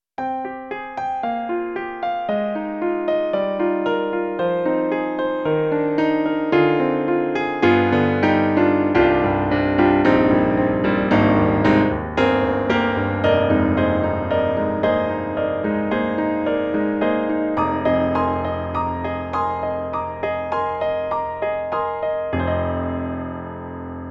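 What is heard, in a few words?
Studio Grand, piano; Roland; SonicCell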